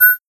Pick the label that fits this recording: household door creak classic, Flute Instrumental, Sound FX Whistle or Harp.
Sound FX Whistle